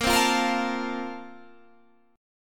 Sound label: Bb Major 7th Suspended 2nd, Guitar, Standard; 18,15,15,15,18,17; strummed